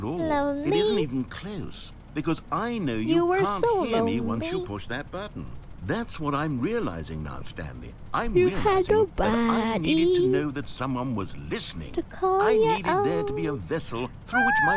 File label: Singing; IRyS